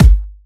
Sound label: Soft Kicks; Vengeance Samples